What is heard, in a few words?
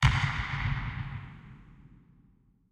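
artillery start fire, tanks; oneshot; sfx tanks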